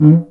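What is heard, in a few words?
Cuica, Roland S50 Percussion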